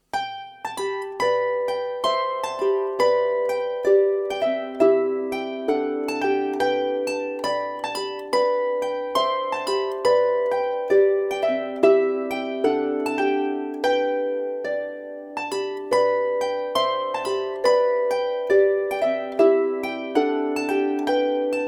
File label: Tjockön Polska